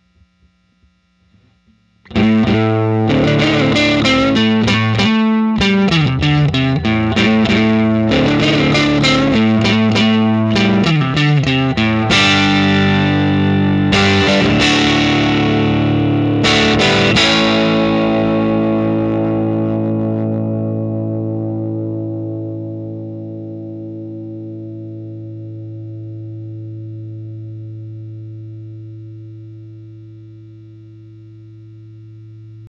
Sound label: 6v6 dirty